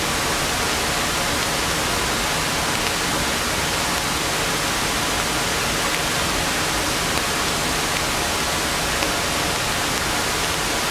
VHS and Tube Noise; ESW Ear Candy Noises

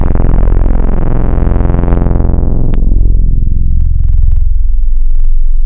ARP 08, Analog Dreams Tables; Synthetic's Serum Collection

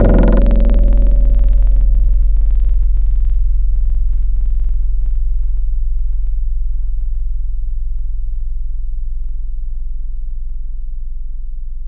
Chromatic Hit, Tropical Mallets; Synthetic's Serum Collection; SparkPackers

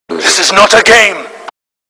shout

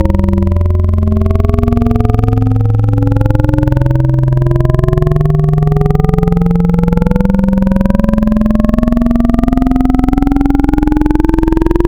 Vocal - Vowel Sweep, wavetables; Synthetic's Serum Collection; ESW Core Wavetables; Echo Sound Works Core Tables

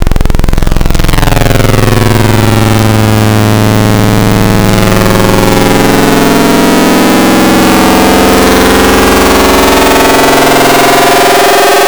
Fm Lo-Fi Saws, Xfer Serum Wavetables; Synthetic's Serum Collection; Cymatics - Nuclear for Xfer Serum Wavetables

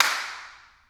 Claps; Attacks Misc

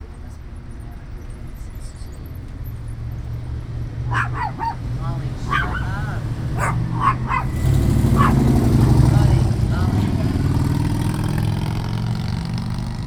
Dogs Barking And Loud Vehicle, Berkeley; Going To The Vet In Berkeley

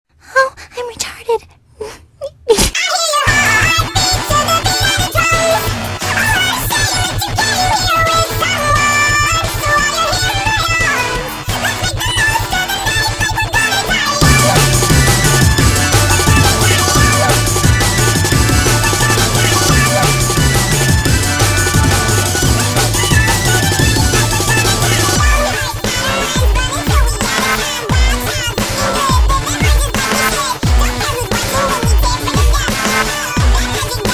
beat my drums

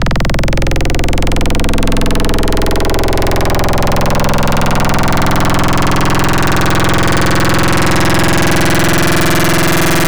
Glass Formant; Digital; Synthetic's Serum Collection; Echo Sound Works Core Tables

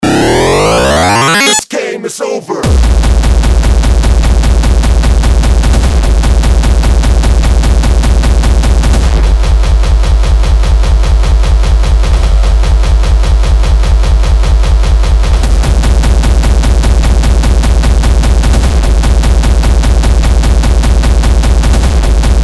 techno; lolicore

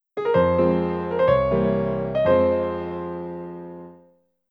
Sad Message; Wii Room (Shopping Update) Soundtrack; Wii Channel

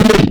Hoo; Digital; Synthetic's Serum Collection

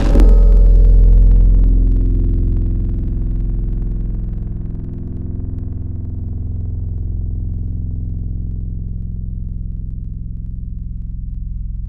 Caribbean, Tropical Mallets; Synthetic's Serum Collection; SparkPackers

